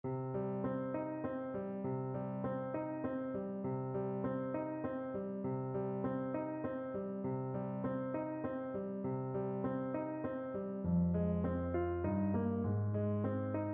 without a wind, Trumpet

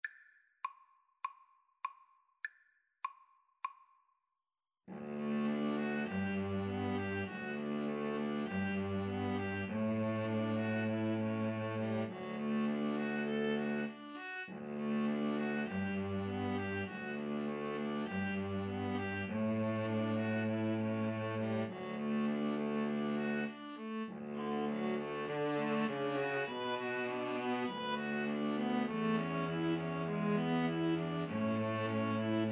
blue bells scotland, string trio

